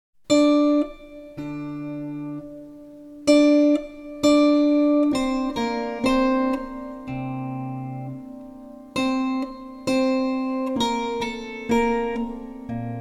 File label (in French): Concerto pour orgue en La m 2è Mvt; J.S. Bach